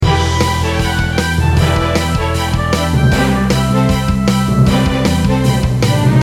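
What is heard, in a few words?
Short RPG, BGM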